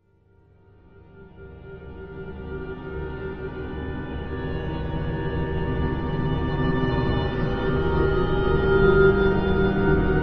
Music Eerie Haunted, orchestral

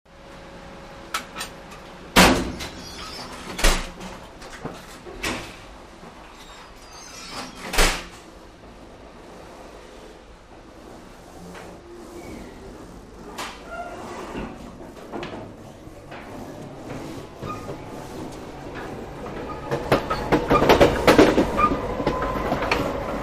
Train Start Away Ext, electric

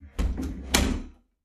door wood close

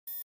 Flash Beep Elect Tone

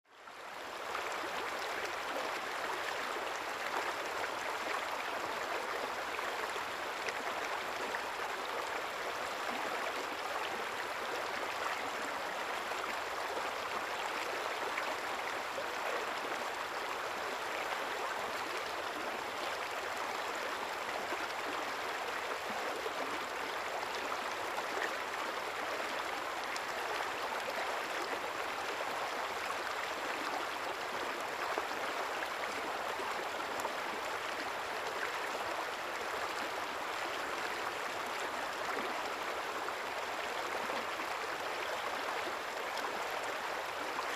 Small Stream Flowing

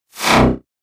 Elect Whip Lfe

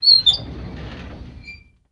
Drawer Metal Open Qu; drawers

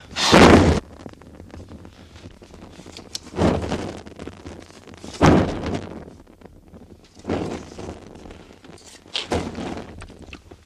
Benzine Pouring On F; torches

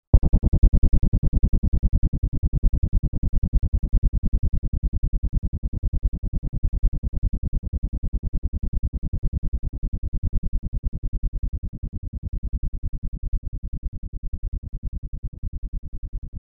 Chopper Sustained, chopper

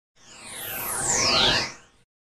Flanged Whoosh Short, synth tones

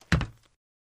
FS Runner On Metal Rig, singles metal

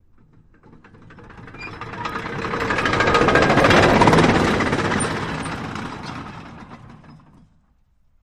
Bulldozer Free Tread Roll Down Hill Fast; Metallic Clunks, Diesel Motor, Medium Perspective.

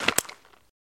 Holster Draw Gun Mov; holster mvmt

